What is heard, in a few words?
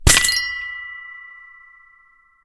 Egg Break; Reward Egg Break Lvl-1; Angry Birds